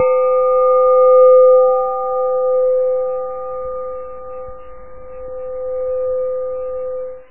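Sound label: gong single gentle long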